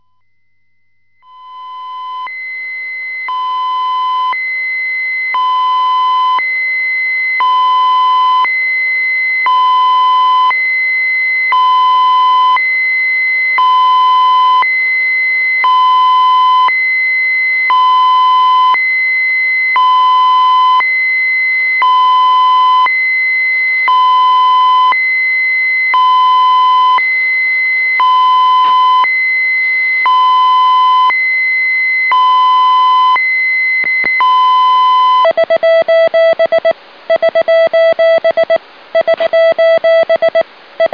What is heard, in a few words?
Hayabusa Beacon; はやぶさビーコン音; Arduinoで小惑星探査機「はやぶさ